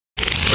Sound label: chainsaw start, weapons